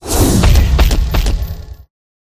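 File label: troll buff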